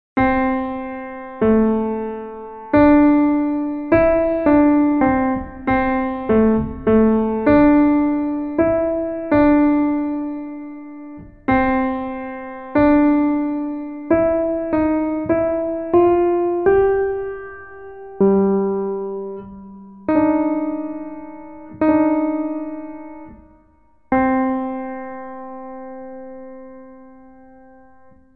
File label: Jazz Walk